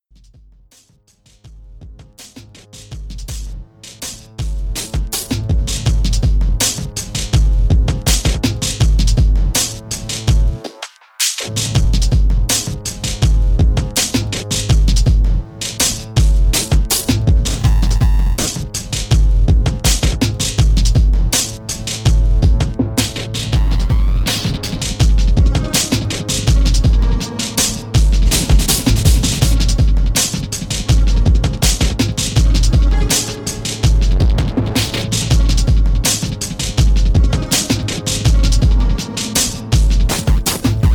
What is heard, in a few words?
Breccy Crunch Glitch IDM Mix